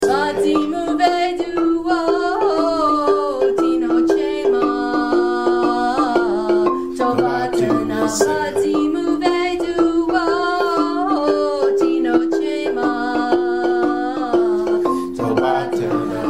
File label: vocals line; kare mugomba